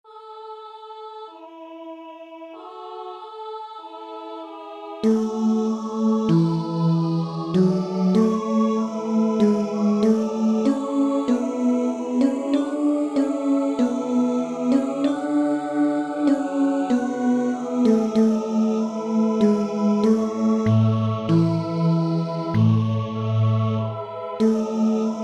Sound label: Tenor; Confirma Hoc